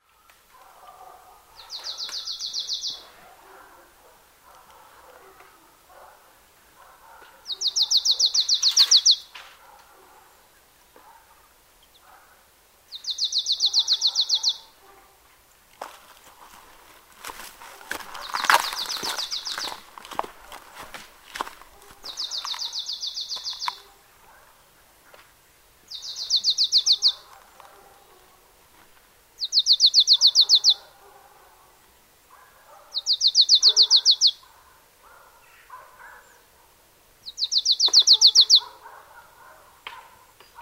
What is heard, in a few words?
Poecile palustris; SETE